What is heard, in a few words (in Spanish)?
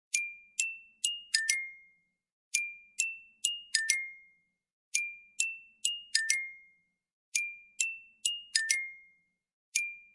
muy suave